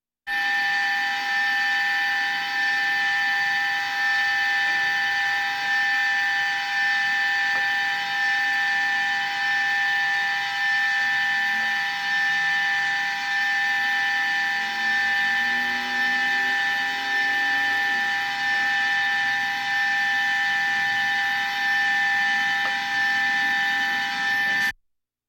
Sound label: Military vehicles